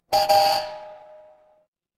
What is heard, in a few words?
door doorbell